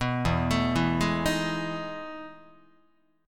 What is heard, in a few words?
E Major 11th E, Guitar; EM11 arpeggiated; JGuitar